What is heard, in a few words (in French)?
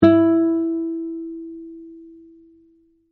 mi aigu, accordeur guitare